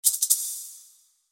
Rattlesnake

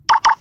Door Lock LG Aristo

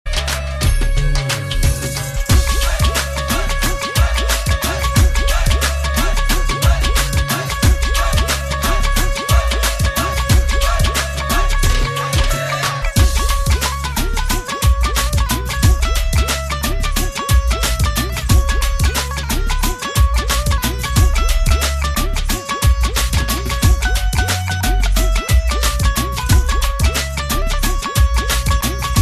Tumbi

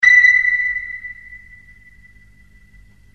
Echoes Sonar Notify

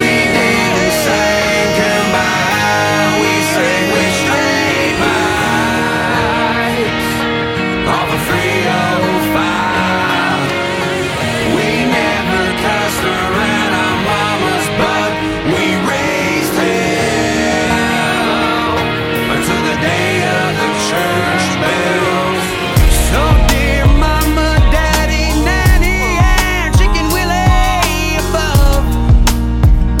Bells

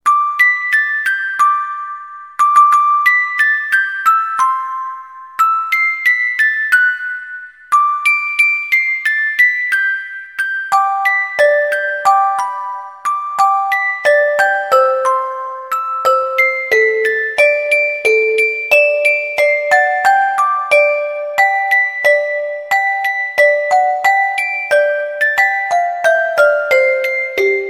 Fantastic Piano

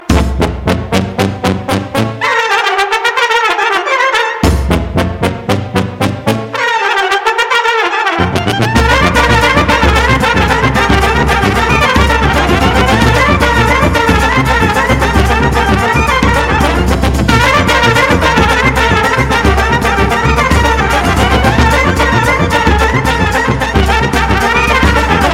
Fanfare

